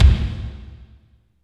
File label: KICK, Tekno Industrial; East West